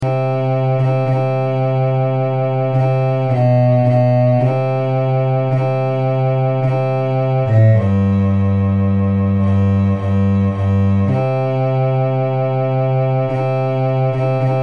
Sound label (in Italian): melodia bassi; ai preat